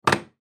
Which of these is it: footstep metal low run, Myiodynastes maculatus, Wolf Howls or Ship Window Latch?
Ship Window Latch